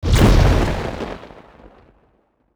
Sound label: portal shot